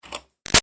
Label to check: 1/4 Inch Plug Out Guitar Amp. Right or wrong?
Right